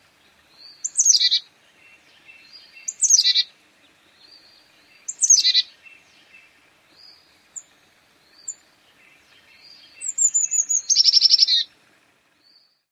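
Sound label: Carolina Chickadee calls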